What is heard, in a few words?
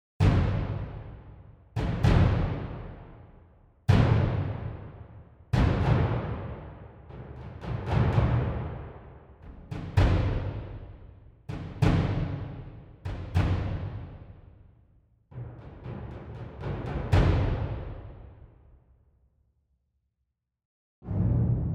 Percussion; Darwin